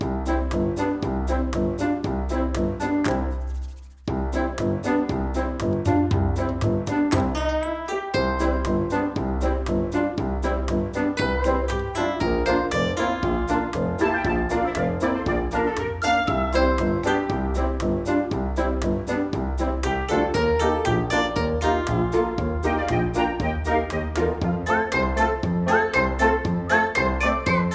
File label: Casual Game Music; Xanchuchamel